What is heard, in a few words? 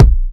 smooth kick sample a sharp key; kick drum samples